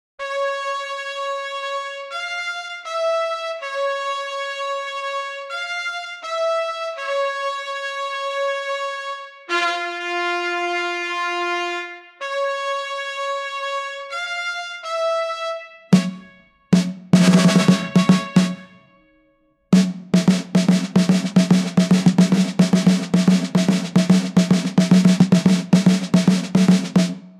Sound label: military tribute anthem